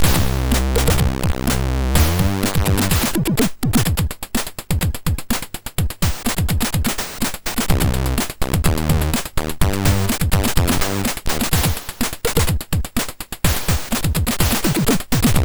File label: Music, sid MOS6581R4